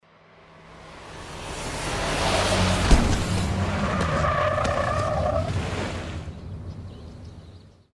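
hit on a dirt road, flew off, fell and also the head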